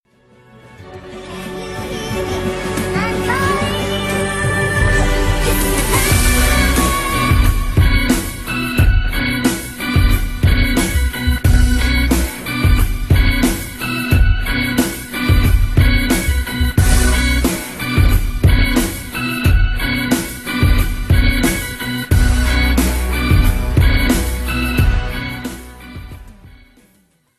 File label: hip hop